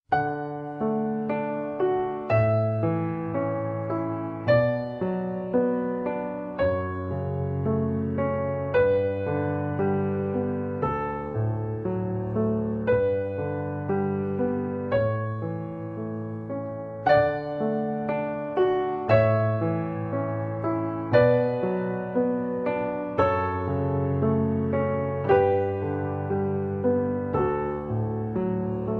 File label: pachelbels canon d